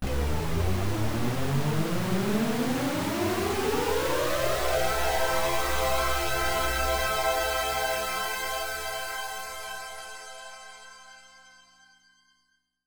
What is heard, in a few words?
setup sweep xbox